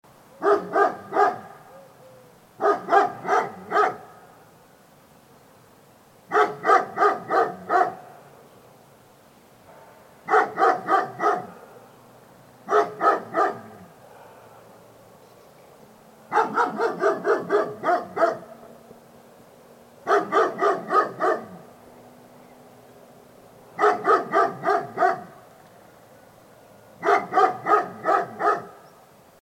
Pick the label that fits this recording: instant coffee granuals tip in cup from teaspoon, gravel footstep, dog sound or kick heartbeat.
dog sound